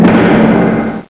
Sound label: thud, cartoon sfx